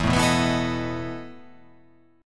E Major 7th Suspended 4th E

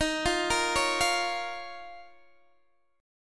D sharp 7th Suspended 2nd, Guitar, DADGAD

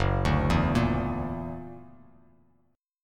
F sharp Augmented 7th; F#+7 arpeggiated; 2,5,2,3

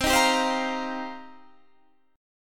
C Minor 11th C Guitar Standard; strummed; JGuitar

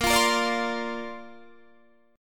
A sharp Major Add 9th, Guitar Open D

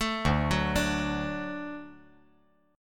D Major D down

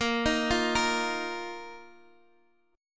Bb Suspended 4th, Bb, down, Guitar, DADGAD; arpeggiated

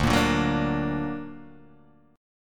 D Augmented Guitar Open D, chord

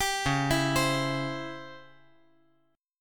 C sharp Minor Major 7th Flat 5th, chord; C#mM7b5 arpeggiated; 12,13,12,15; JGuitar

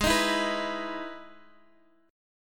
E Major 7th G sharp

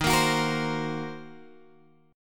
D sharp Minor 6th D sharp down Guitar Open G

